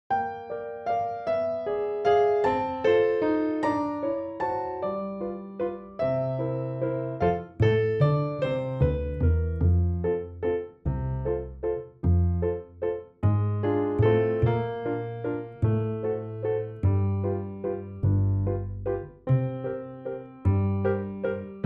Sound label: sweetheart, Flute